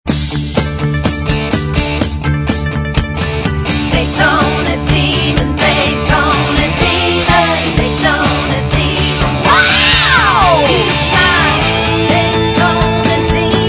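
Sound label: backing vocals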